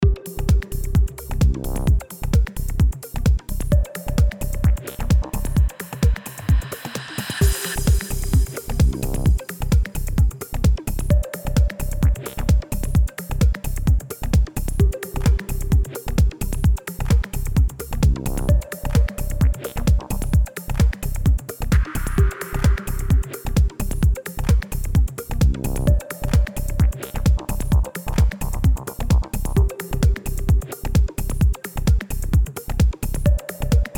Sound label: house bypassed